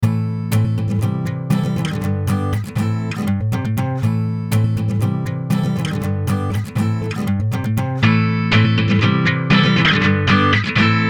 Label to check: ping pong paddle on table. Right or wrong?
Wrong